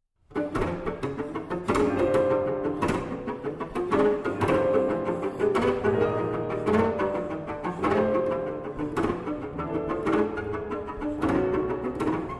Cello Sonata in C Major, Moto perpetuo. Presto, Live; Op. 65; Ed. Rostropovich